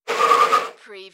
Car or motorcycle brake, tyre screech

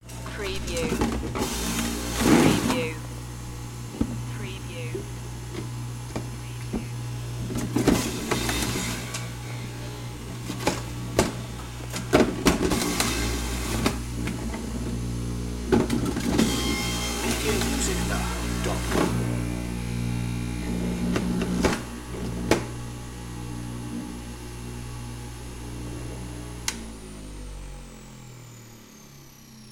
Juicer

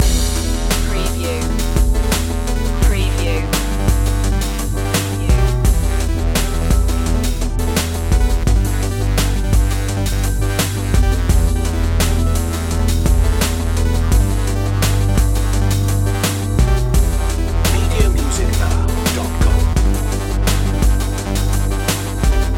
deep pulse loop

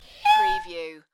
Cat Meow

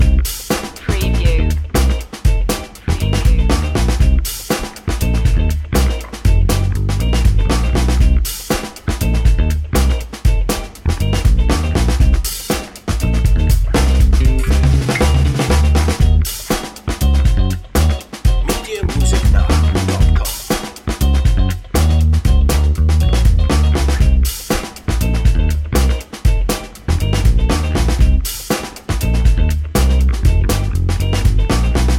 groovy funk loop

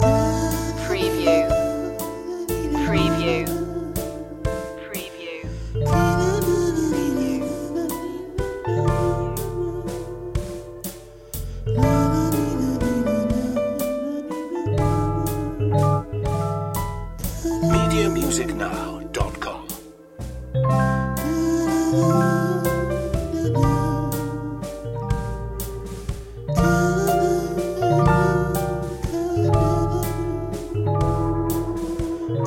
lonely waltz loop

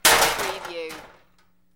Metal Medium Impact Settle

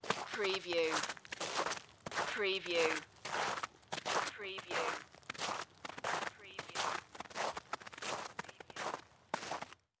Footstep Slush Walk

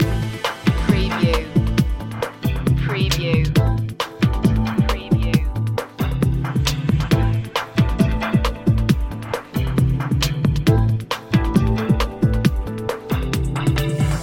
in a good mood loop

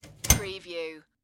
Vent Close Metal; Sound Effects Bible

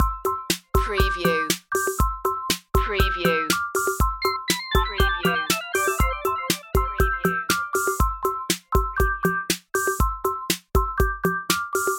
casio

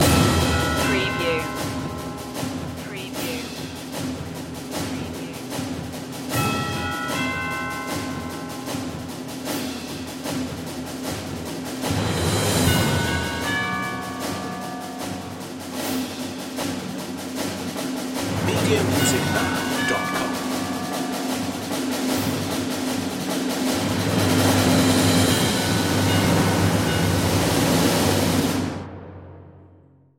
war of attrition percussion only

